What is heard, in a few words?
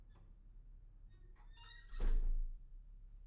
door slam; IEEE AASP